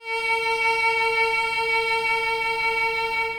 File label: A sharp 4 reverb vib